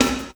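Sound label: snare drum, Drum & Bass; Ueberschall; Drum & Bass Flash Trackz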